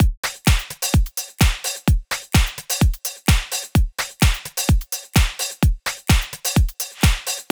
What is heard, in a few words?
Full Drum Loop - 128 BPM, House; Drums; Loops; Cymatics; House Starter Pack